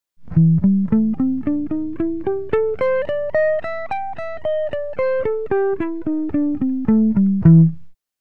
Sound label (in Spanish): blues menor